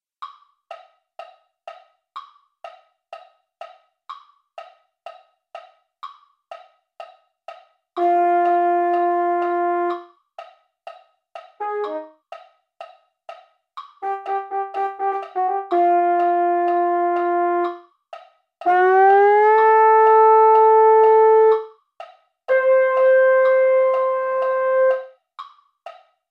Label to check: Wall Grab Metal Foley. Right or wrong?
Wrong